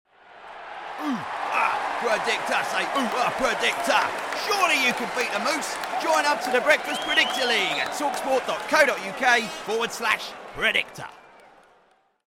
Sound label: football chant; talkSPORT predictor league